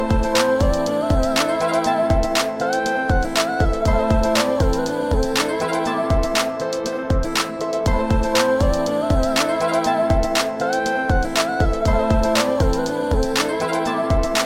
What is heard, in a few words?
Melody